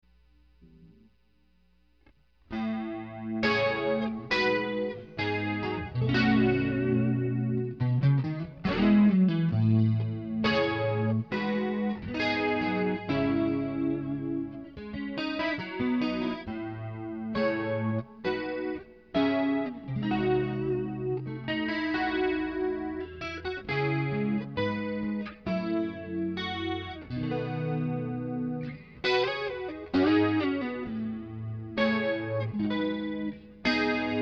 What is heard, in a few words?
Jazzy